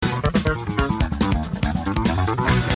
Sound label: Bass